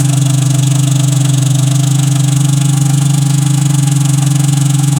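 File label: a 900cc motorbike full speed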